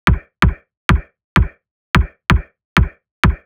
perc 128, Electro percussion loops; Anthemic

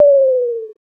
HOUSE FX 125

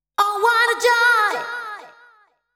deep house female, 125, A, vocals

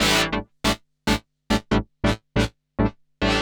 SPACE SYNTH 140 A; Keys; SpaceSounds

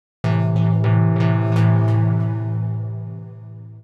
DEEPHOUSE ORGAN 125 A

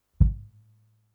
Kicks; Touch Loops; CosmicFunk

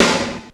SD HOHL, snare drum; SNAREDRUMS

